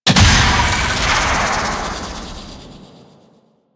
Grenade Explosion Blast; Enemies; Warframe; Infested; ZealoidPrelate

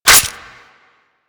Dual Pistol Mech 2D; Grn Queen Guard Dual Pistols

